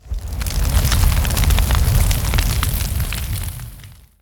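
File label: Flame Breath Sweet; Evolve; Goliath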